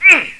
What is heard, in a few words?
Effort Sound; Realmz 6.0.3; The Family Jewels